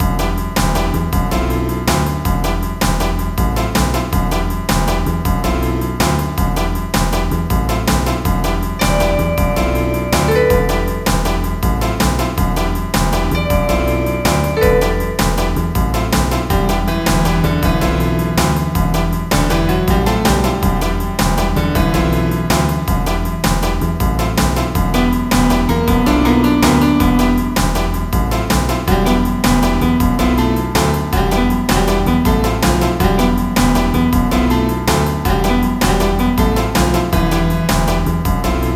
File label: lose; fheuchre